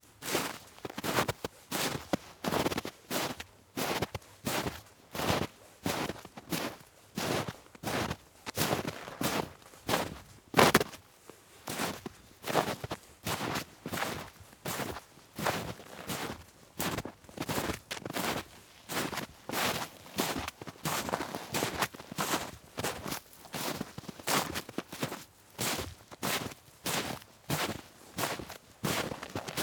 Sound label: Footsteps In The Deep Snow